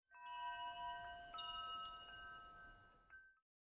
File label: Ambience Scatter Chimes; Josh Journey Darkness Totems; PS4, Switch, Windows, Xbox One gamerip; Santuario